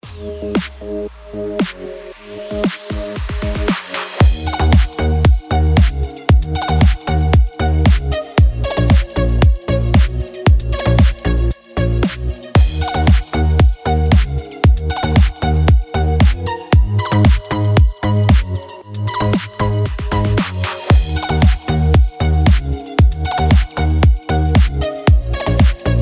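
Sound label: downtempo, D# minor, 115 bpm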